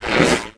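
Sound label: droid hit; Star Wars Knights Of The Old Republic I; XBOX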